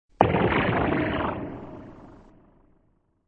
Rifle Shot, Single low pitched rifle shot with long fade.; War